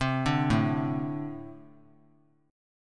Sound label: A Minor Double Flat 5th, down; arpeggiated; JGuitar